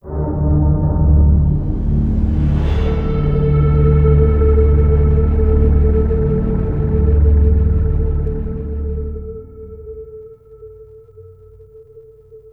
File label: Arena Reveal Stinger; Octopede; Warframe; Man In The Wall